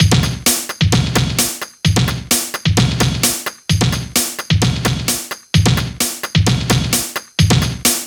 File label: techno; INIS; Funky Xtreams